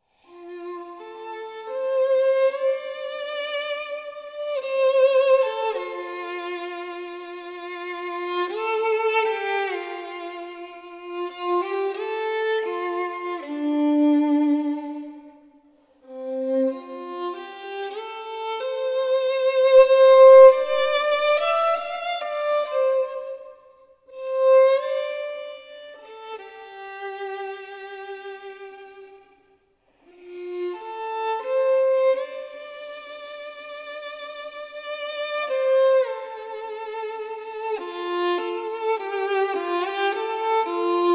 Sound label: Violin And Piano